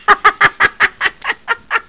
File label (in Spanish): Risotada